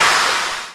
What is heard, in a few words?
FX Downlifter